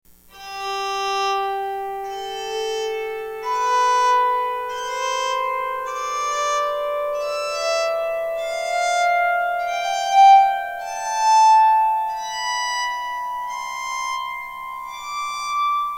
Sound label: scale, psaltery